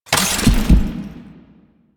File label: Sniper Aim In; DE; Warframe; Warframes; Frumentarius; ExaltedSniper